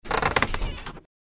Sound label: wheel; anno-1404